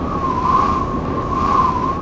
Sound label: Man Death; Knight Online